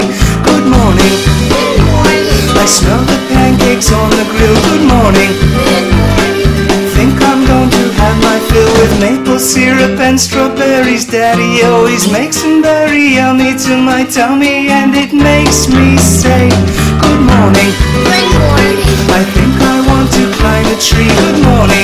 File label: Cute Morning Alarm